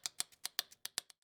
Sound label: idle loop non aggressive; Barotrauma; Barotraumatic; Clowns; ClownSawcrazy